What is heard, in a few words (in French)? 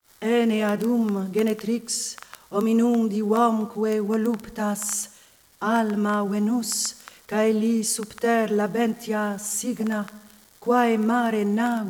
Choeur Crackle; Choeur Eaneadum Genetrix; venus vagabonde